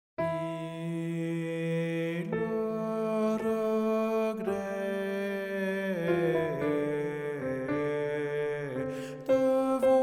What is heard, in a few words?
SOPRANO; MILLE REGRETS